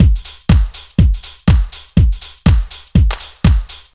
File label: beat dissected live house